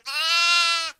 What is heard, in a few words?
bah